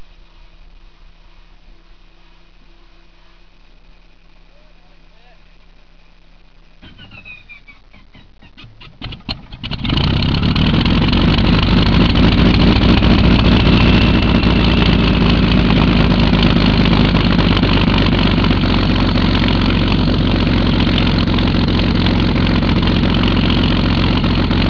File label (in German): bf109 anlassen